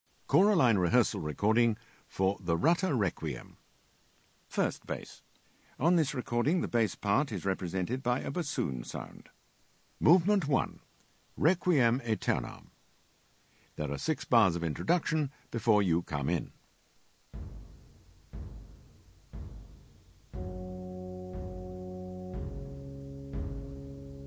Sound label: Bass